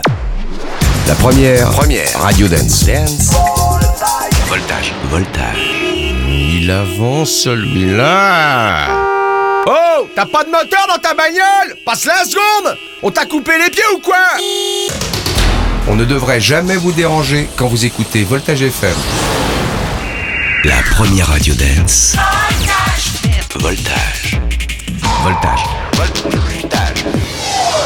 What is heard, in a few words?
jingle; VOLTAGE; PATRICK POIVEY (BRUCE WILLIS)